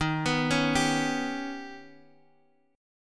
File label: D sharp Augmented 7th D sharp, Guitar, Open D; 13,14,11,13,x,x